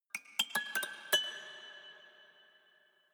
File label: haunted strings tinkle sequence slow short